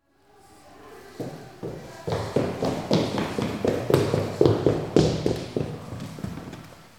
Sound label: Small Kid Running